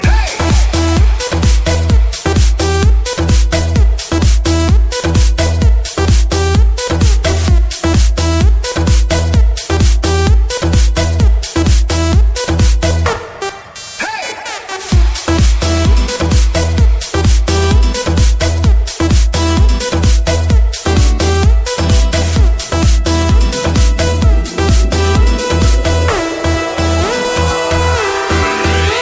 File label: Bass